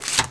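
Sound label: taser pickup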